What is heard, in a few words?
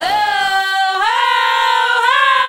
"ho ho ho", voice